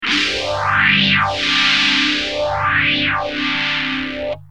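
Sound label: guitar tokai tph2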